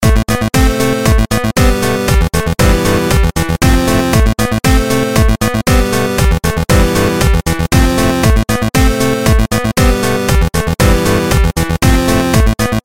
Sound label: Dance Beat